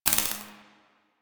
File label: Laser Skin Printer Laser Stop; Ambience; Props; Warframe; Entrati